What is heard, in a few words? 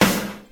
Snare, drum hits; Jaxson; Nu Dance Electro